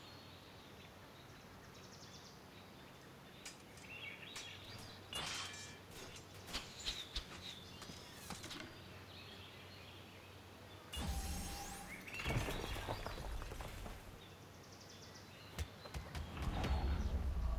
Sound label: amb dome main; tft sf2023